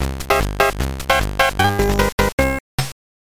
Miss

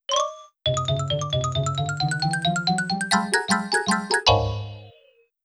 Jingle Not pleasant Food

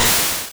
Super Fang; Pokemon; Gen 1; attack moves; RBY

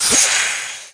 TURBO

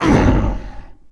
Monster Dragon Death; Inotia 3

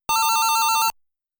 Extend Sound

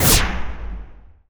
IMPACT Energy Solid mono

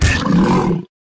Hit Crawler; Lethal Company

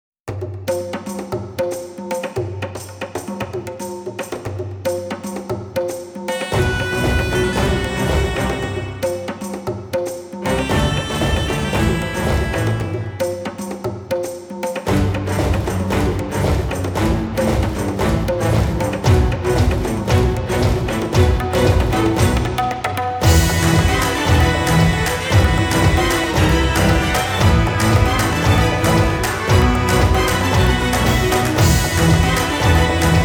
Instrumental; fire emblem fates if english

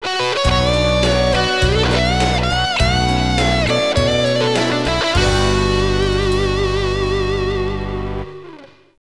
Lose; Data East's Stadium Hero 96; Deco MLC System; arcade

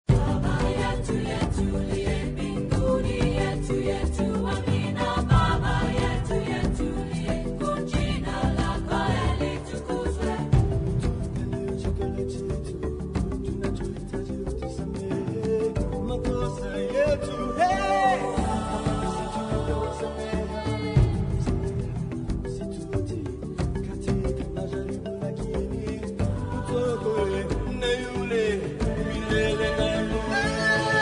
Happy Village; sid meier s civilization revolution 2 2015 vita

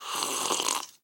Coffee Normal Drink

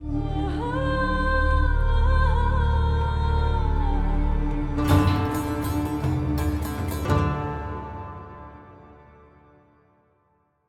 Eastern Death Stinger Short

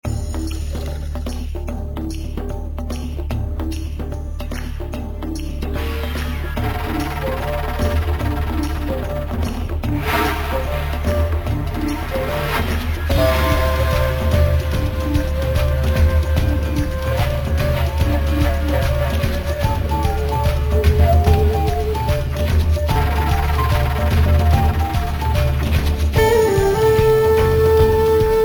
Swamp Theme; Sheep Raiders

